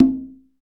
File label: percussion congas